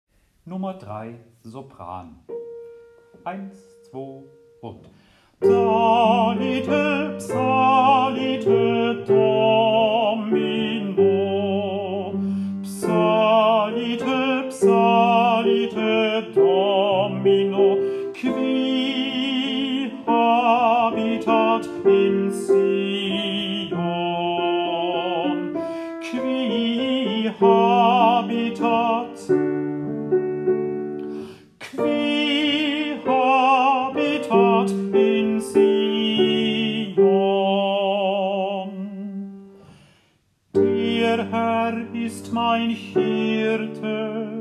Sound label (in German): Sopran